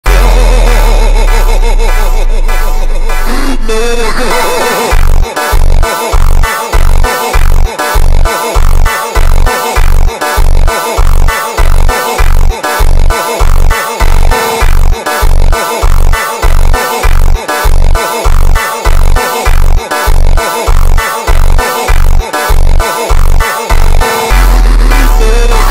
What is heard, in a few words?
super slowed brazilian phonk